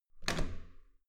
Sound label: metal door heavy handle up hard